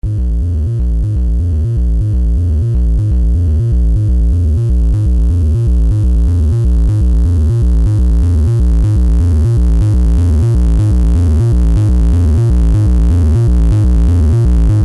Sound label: analog synthesizers sequencers; dr zee workshop